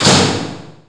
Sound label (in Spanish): Plancha Muro; Revista CD Expert 42; Grounch